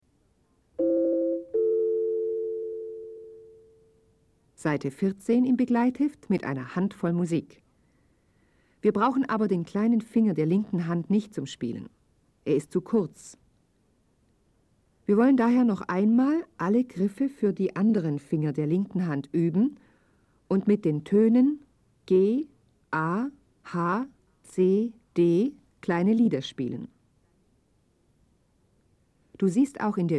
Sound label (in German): Spiel Blockfloete